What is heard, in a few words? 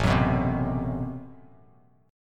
A Minor 6th Add 9th A Bass Standard, Chord; Am6add9 strummed; 5,9,9,5; JGuitar